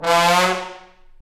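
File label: Trombone Section slide, Brass Sections; Roland LCDP06 Brass Sections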